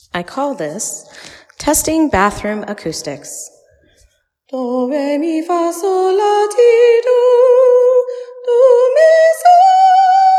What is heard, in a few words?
bathroom acoustics